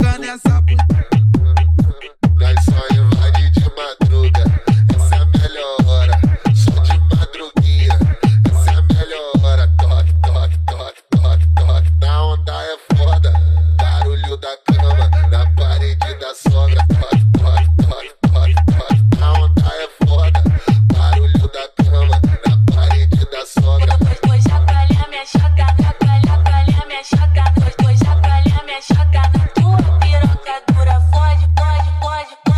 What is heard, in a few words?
funk bass